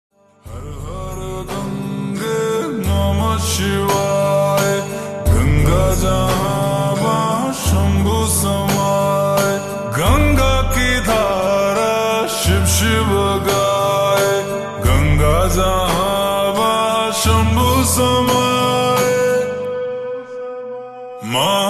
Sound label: Har Har Gange Namah Shivay Slowed Reverb Ringtone